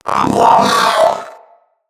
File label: Companion Vocal Alert, Pets; Zanuka